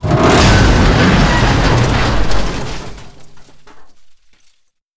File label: Tail Boom Damage; DCS